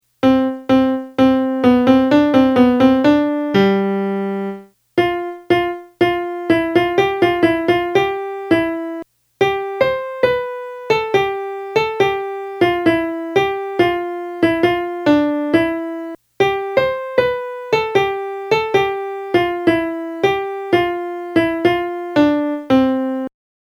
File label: melody